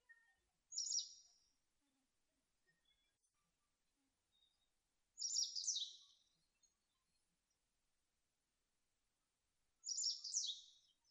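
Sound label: segurosekirei song, Japanese wagtail; saezuri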